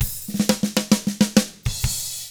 SHUFFLED, Drums; ILIO; Double Platinum Drums 1

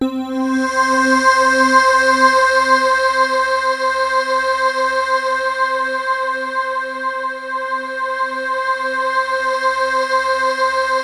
SNOWFALL; MORPHEUS

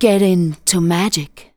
vox, Techno Trance; Ueberschall

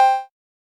Roland Juno D 808 & 909 Kit COWBELL

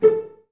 CL PIZZ A3, Advanced Orchestra; Best Service; Peter Siedlaczek's Advanced Orchestra

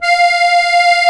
DIATONISC, AKKORDEON

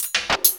202 DERI HH, CYMBALS, Industrial Loops; USB Soundscan vol.24; AKAI

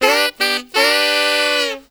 SAX, W. AFR.; Spectrasonics; Heart of Africa

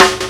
snare tail; PlatinumPhattCD1; E-MU Producer Series Vol. 8 – Platinum Phatt; Mo Phatt

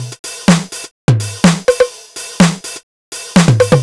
drum loop monday top 125, Classic Chicago House; Sample Magic; Drum Loops

